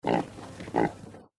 boar snort; npc; courier

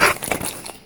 alert, movement; crystal maiden

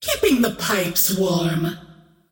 scream whiff; queenofpain

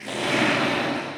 crit spatter; weapons; dota; phantom assassin

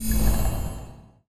menu activate close; ui; dota

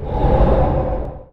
wing, movement; obsidian destroyer

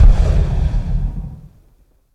concussive grenade target; weapons

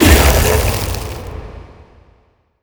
leech seed target; treant

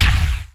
frog stun; weapons; dota; largo

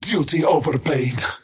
skywrath crown move pain; skywrath mage

